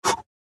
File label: Punch